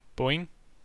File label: Boing